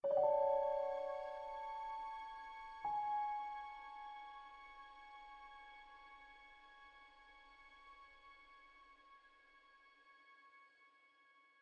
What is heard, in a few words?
Suspense Short; Pillars of Eternity